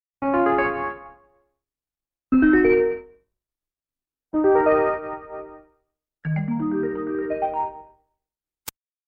Fanfare; that's atari music; g.s.m.; atari games; Klax

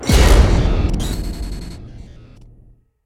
Fight High Death; Remember Me

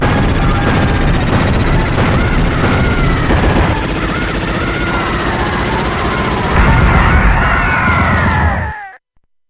Parrot; hook data east pinball soundtrack

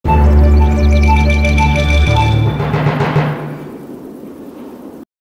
Block Jingle; Asterix at the Olympic Games

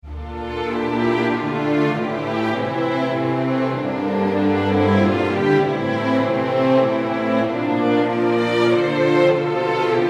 Field BGM; Xenoblade Chronicles 3

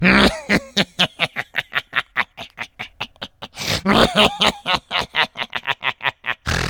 Level End Emperor Laugh; Angry Birds Star Wars 2

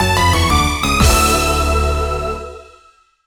Jingle Finish; Clubhouse Games: 51 Worldwide Classics

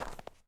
Footstep Snow; Among Us

